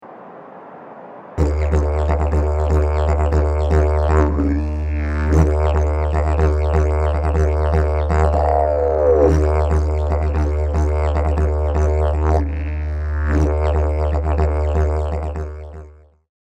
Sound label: Didgeridoo